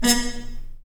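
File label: brass trumpet mute stab, Harmon stabs; Roland L-CD702; Harmon Amb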